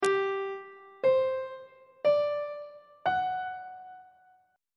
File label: g major 7th suspended 4th chord intervals on treble clef